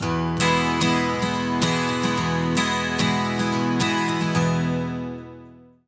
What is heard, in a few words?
110 MAJ 12 STR, Acoustic Guitar Loops; USB Soundscan vol.04 - Electric & Acoustic Guitar Loops; AKAI